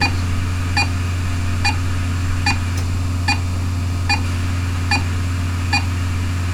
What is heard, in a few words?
RESPERATOR, MACHINES